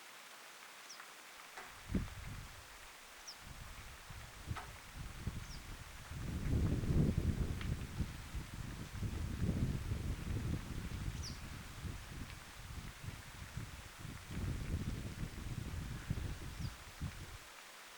tuollainen keltavastarakkilintu

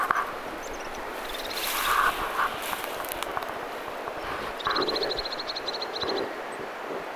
toyhtotiainen jalleen saaressa

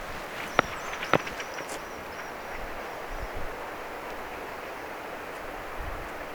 mika laji ilm jokin rastas

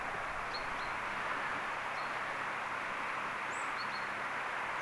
talitiaisen peippomaisia aania

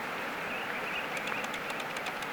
tyllilinnun aanta

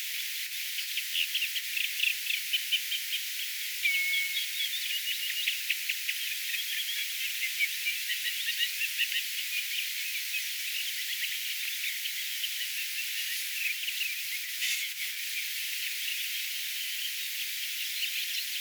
pikkusen kuuluu juuri ja juuri rannan ruovikkosaarekkeesta rytikerttusen laulua; juuri ja juuri erottuu äänitteeseen tässä hiemen rytikerttusen laulua

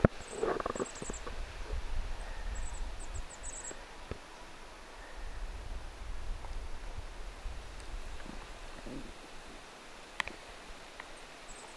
tuollaista hippiaisen aantelya

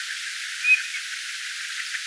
vähän samankaltainen ääni kuin rubiinisatakielellä

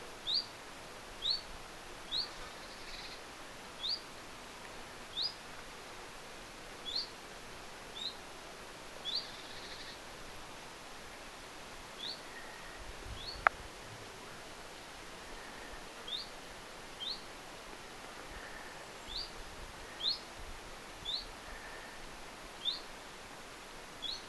tiltaltti; vit-tiltaltti?

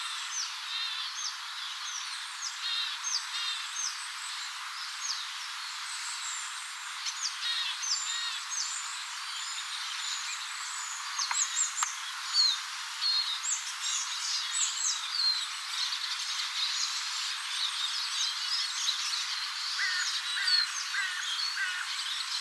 siella on pikkulintuparven taustalla suuri vihervarpusparvi ilmeisestikin; vihervapusparvi pikkulintuparven taustalla?

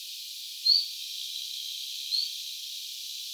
jälleen idäntiltaltti ainakin äänen perusteella voisi olla; jälleen ainakin idäntiltalttimaisia ääniä

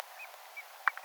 nuoren valkoviklon aantelya lahiaania siistitty hiukan esimerkki

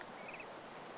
tuollainen tyllilinnun aani

